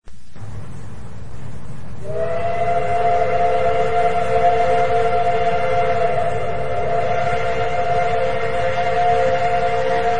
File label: steam train whistle